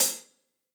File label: Acousti Hat Hit, hihat; ultimate hihat; Hits; AcoustiHat B